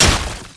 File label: basicattack human; LoL; shyvana